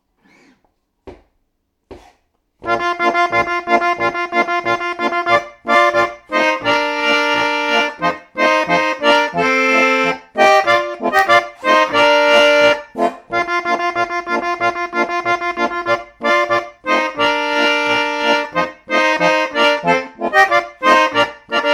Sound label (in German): Schottisch; Fingerli